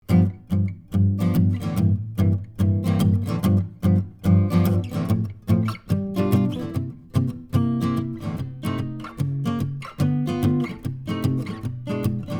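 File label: Jazz, vintage AKG C414 COMB condenser; SANTA CRUZ OMPW; CARDIOID; straight signal with no additional EQ or effects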